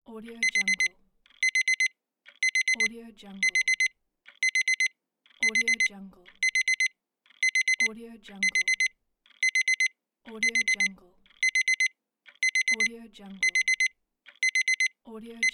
alarm clock small plastic bedside; bestsfx

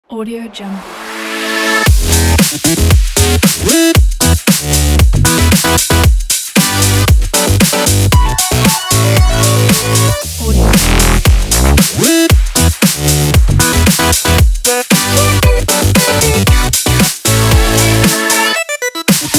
that dubstep

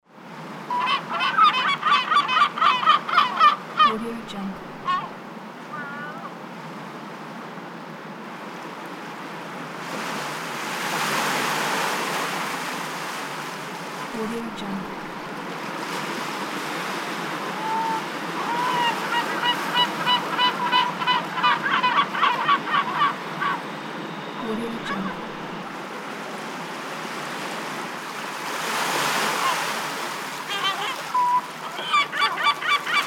seagulls

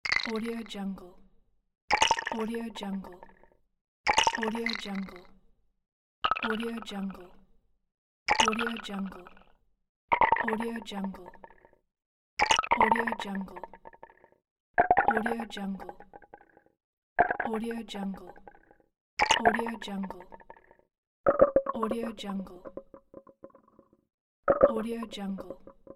wooden ball toybox

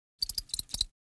bug legs vibrate

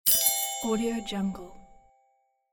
collected coin

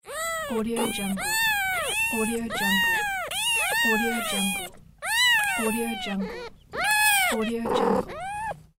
small kittens meows and purrs; jdproduction

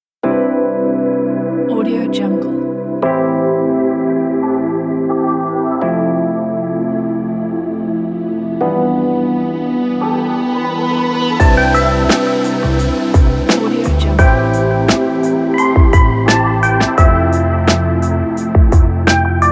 atmospheric chillhop ambience

